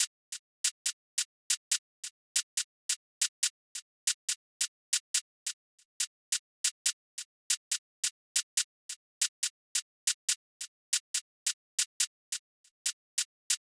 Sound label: Drum Loop - 140 BPM Hihat, Drill; Loop Stems; Cymatics; PARADOX II - Drum Loop Collection; Bow